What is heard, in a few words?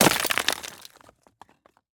stone impact; BluezoneCorp